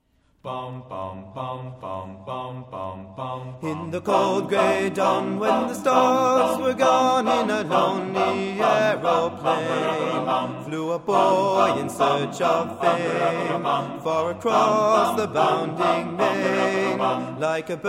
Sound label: A Cappella